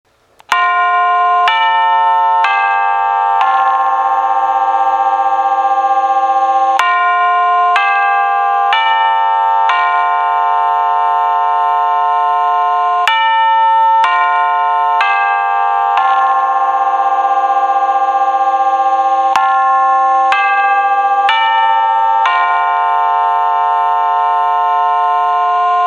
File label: Quad Westminster